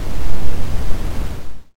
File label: ocean